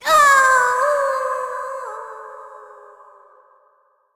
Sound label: death; Crypt of the NecroDancer